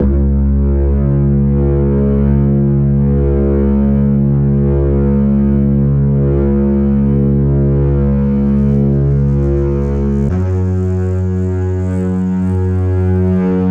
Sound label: bass